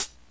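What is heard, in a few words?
closed hi hat; jazz jackrabbit 2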